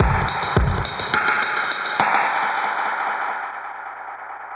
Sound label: Beat FX A 105 bpm, Beats; musicradar; dub designer